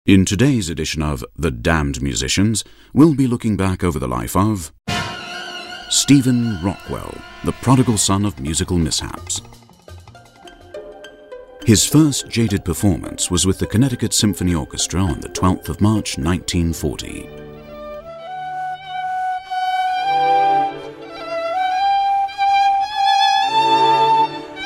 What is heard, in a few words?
Flute